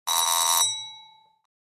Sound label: Electrical 80s doorbell ringing